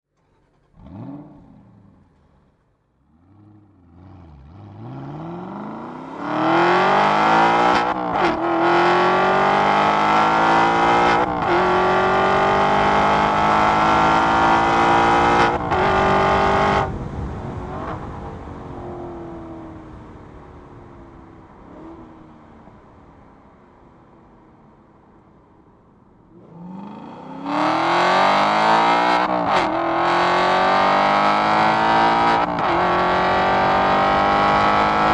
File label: Aston Martin V8 Vantage